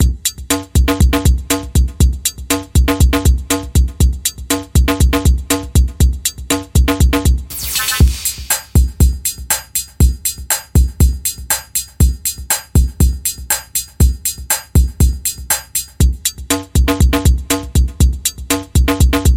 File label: Rock House 120